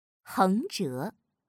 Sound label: stroke